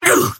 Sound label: pain big